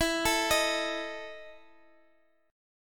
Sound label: E Major 7th Suspended 4th E, Guitar, Open D; chord shape